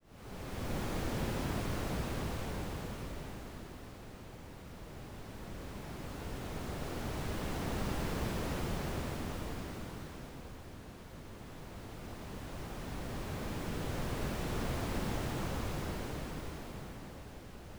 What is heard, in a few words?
desert ambience synth